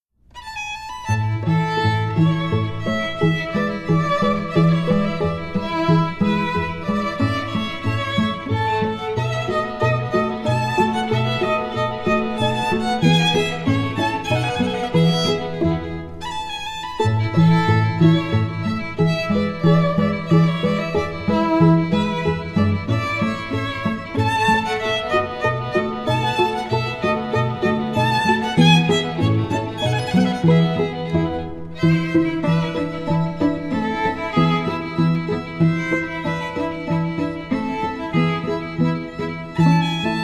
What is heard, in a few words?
Minuet